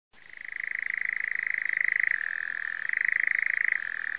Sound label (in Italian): SUCCIACAPRE